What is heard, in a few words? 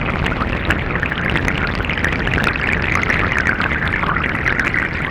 shower